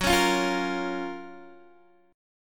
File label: F sharp Major 7th F sharp, Guitar Open D; chordshape; down